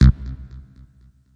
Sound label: deep bass verb pluck; Basses